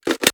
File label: reload empty drum drum hit; reloads; gmod